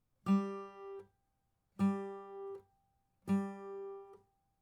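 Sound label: acoustic pattern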